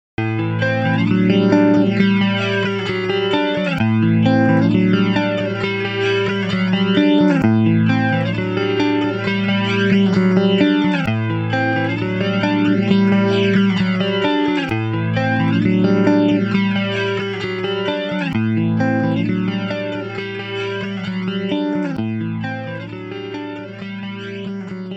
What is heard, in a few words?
Chorus Picking